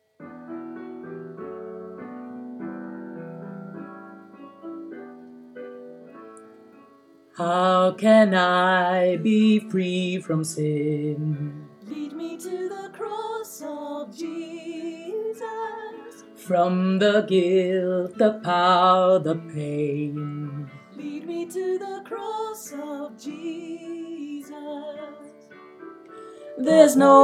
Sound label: tenor